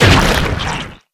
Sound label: hit slime splat; npc; Left 4 Dead 2; witch